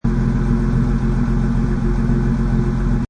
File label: Mustang 68 Fastback Engine Idle Loop Exhaust Perspective; Car; Exhaust Perspective 2